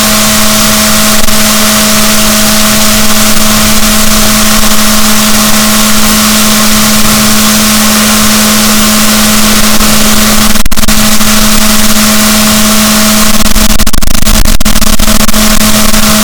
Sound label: rorquals negatif, boat